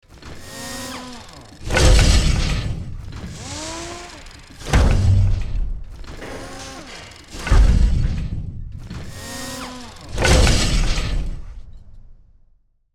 Heavy giant robot footsteps walking; robotic

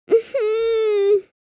Young boy crying

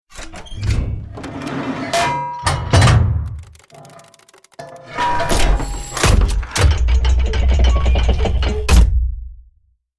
Mechanical metallic sequence gears movement; metallic sound effects

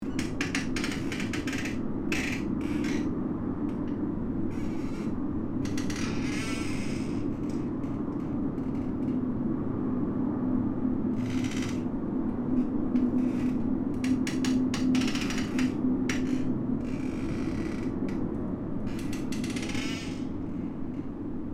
Attic room tone ambience wind blowing

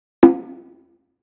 Conga high single; gfxsounds

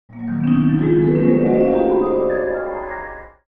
Old vintage cartoon dizzy marimba

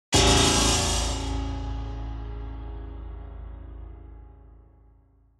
Piano strings hit with reverb

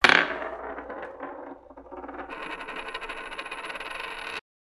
Coin spinning on wooden floor

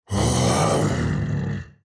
Demon king lord growl grunt

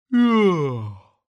Male yawning short

